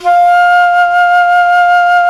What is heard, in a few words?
Jazz Flute; Roland LCDP04 Orchestral Winds; 90 s Sample CDs; Jazz+Singin'